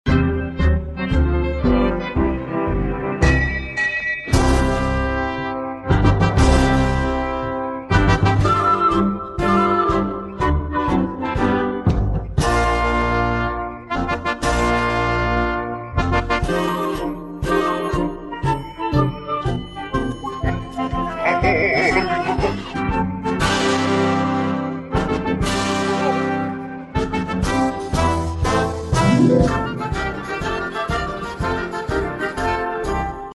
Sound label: Vocal Audition C Alto Bass Accompaniment Track